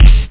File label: Heavy Bass Drum; WBJoyTest1.12